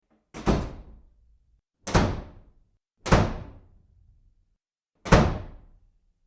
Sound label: door slams fast four times